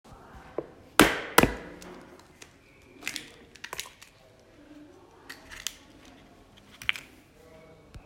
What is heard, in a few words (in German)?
Ei aufschlagen